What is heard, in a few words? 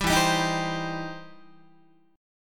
E Minor Major 7th Sharp 5th, E, down, Guitar; EmM7#5 strummed; 10,12,10,13,11,11